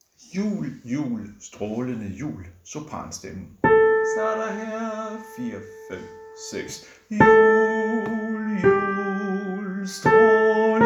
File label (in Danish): Sopran; harlev koret